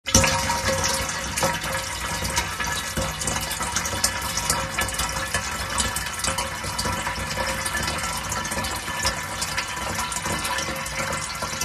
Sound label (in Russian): zvuki kanistry